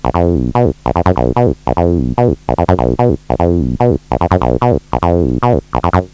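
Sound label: 303 slide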